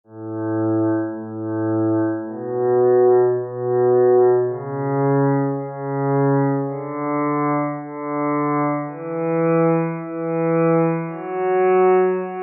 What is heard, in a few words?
cello